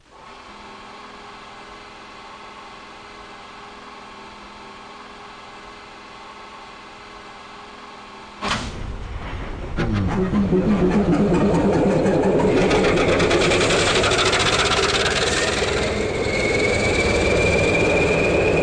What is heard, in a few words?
Diesel PKP SM42 SP42; H0